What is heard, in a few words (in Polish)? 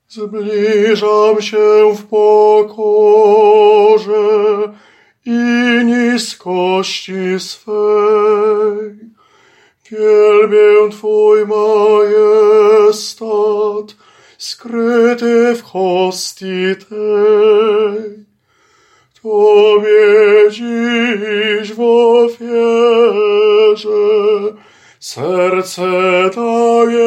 TENOR; Zblizam sie